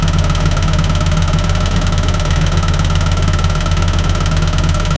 toyota gt86 engine sound; automotive

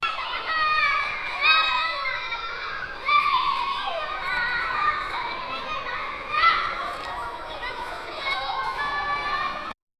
childten playing that fad

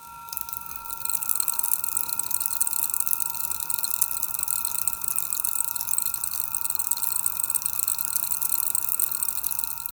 ultra realistic fine dye, laboratory

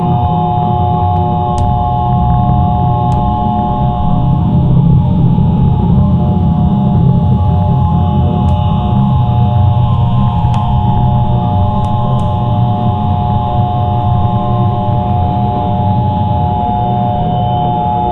muted thumping electronic; electronic music

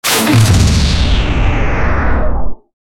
sci-fi blaster, energy weapon; star wars

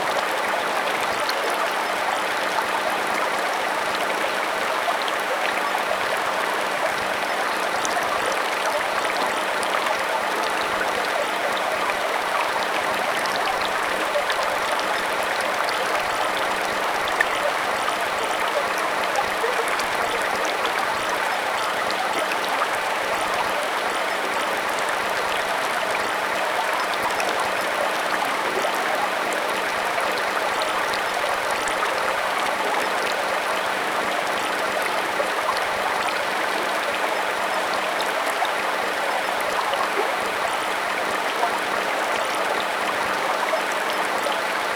clear mountain stream flo, river

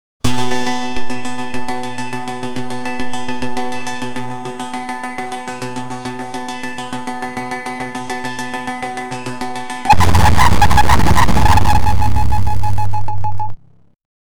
retro pinball bumpers; arcade; retro pinball bumpers eff

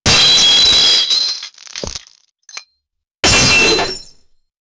a ninja throwing shuriken; bomb

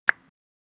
a satisfying tone when ch, user interface

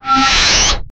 automatic spaceship door

